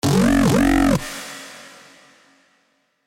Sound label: HONOR 9 NOTIFICATION